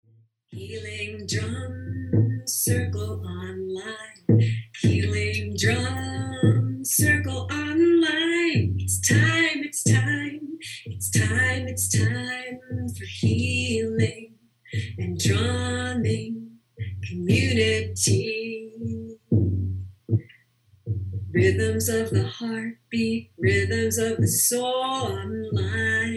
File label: Healing Drums